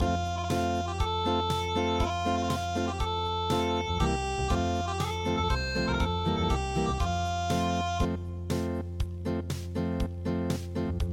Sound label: unpluged